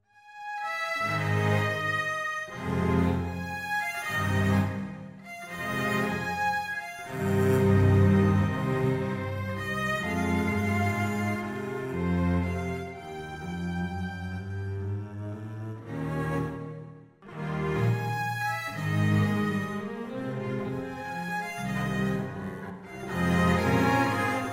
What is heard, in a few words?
Carmel (strings)